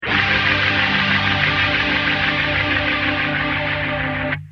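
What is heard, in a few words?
guitar rotosphere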